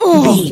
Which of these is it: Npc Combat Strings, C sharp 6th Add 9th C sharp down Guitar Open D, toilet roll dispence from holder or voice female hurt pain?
voice female hurt pain